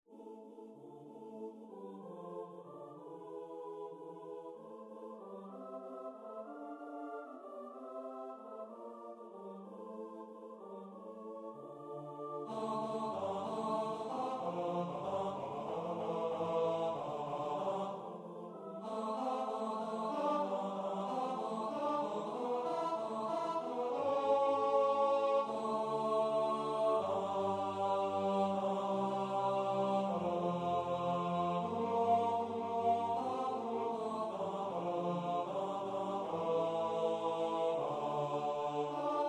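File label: cantate domino monteverdi tenor alto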